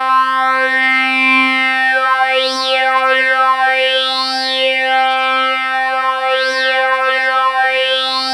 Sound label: C4, SynthLine